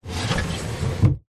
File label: foley wooden box crate lid slide close fast